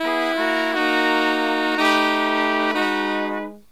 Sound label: Killer Brass Riffs; USB Soundscan vol.29; AKAI